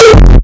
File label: basic hardcore